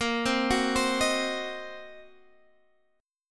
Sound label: Gb 6th Flat 5th Bb, guitar